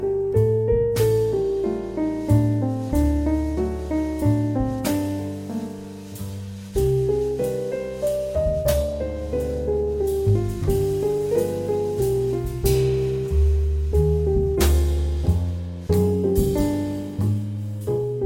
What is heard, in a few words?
Good Morning Ringtone